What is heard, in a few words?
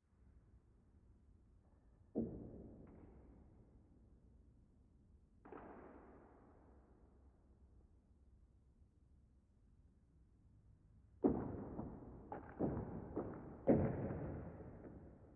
Building Ambient Wood Creaks Eerie Presence; Creaking; Objects; Big Room Sound